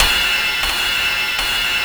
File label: DARK DRUM 130